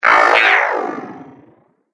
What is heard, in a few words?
Game App Angry Machine, Video Game Sound Effects